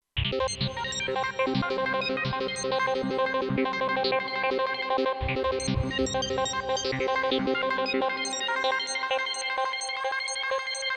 Dukes Up, Kurzweil K2500X

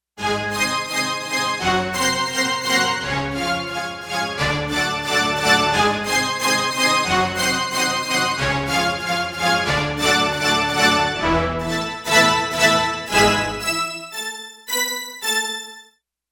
Stacc Stack, synth

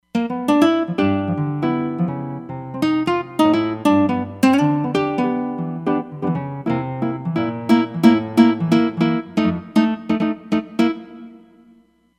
Dyna Nylon

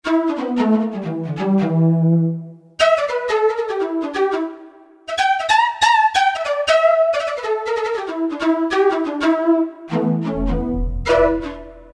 Breathy Chiffer, Roland D-50; Factory preset demos

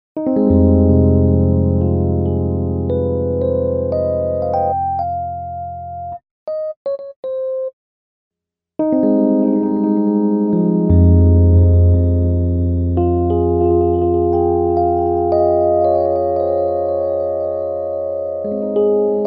Ultra Tap

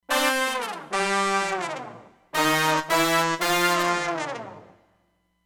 Brass Fall

